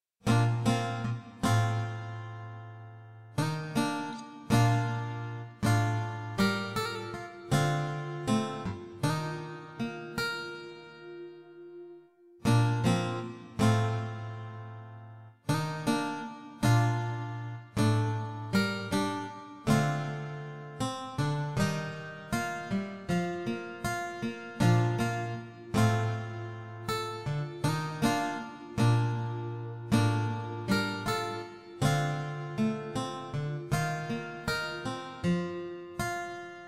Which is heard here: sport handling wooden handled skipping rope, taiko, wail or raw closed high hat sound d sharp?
taiko